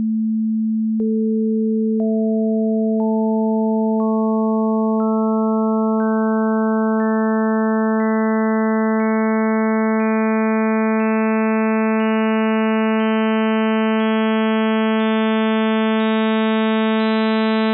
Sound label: Additive 220Hz Sawtooth Wave